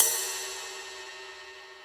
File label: Ride